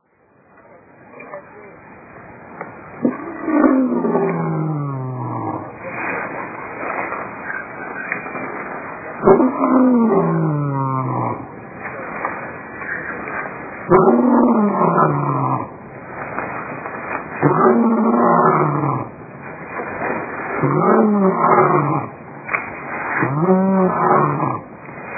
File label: lion